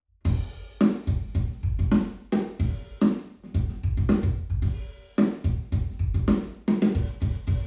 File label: drums, binaural; em32 Aalto